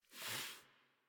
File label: Pet Snort; Enemies; Warframe; Catbrow; PetShip